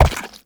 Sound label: Step Goo, Movement; Uriel; Warframe